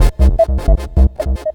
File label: Bass, Futurist Drum & Bass